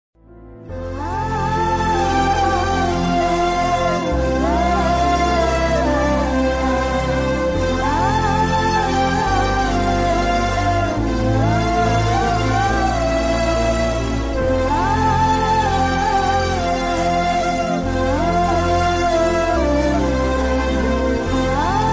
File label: sad scene bgm sad scene background music; policewala gunda 2 movie